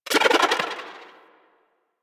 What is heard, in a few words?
Omen Activate; Warframe; Catbrow; Mods